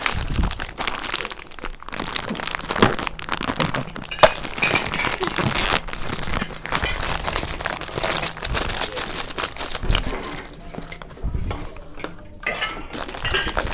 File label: Crinkling bottle caps